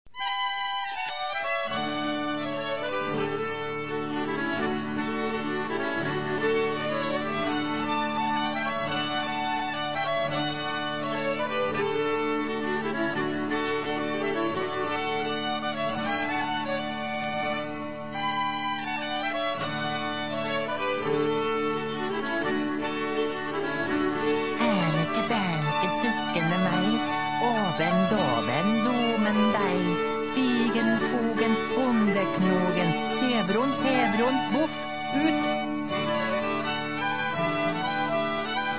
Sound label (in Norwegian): Halling med elling